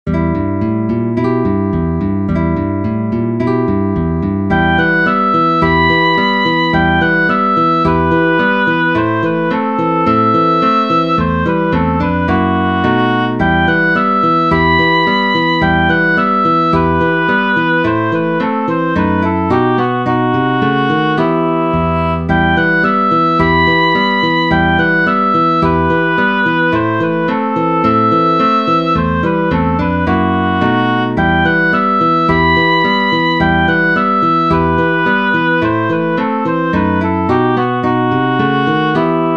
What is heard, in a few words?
Oboe Guitar Duet in E Minor